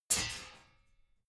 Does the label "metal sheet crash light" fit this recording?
Yes